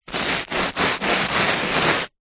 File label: toast wipe rub on surface